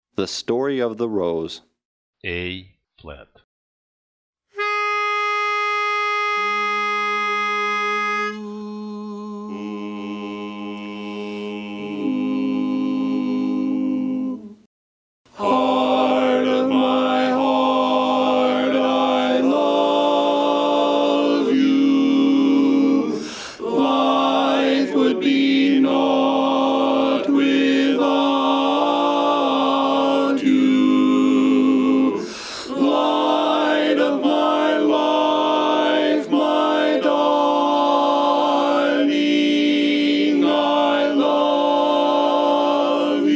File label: tenor, barbershop quartet